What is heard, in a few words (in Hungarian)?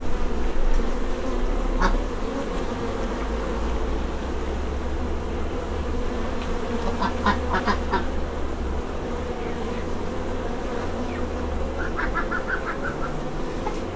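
rajzás kacsa, mezei méh; parasztudvar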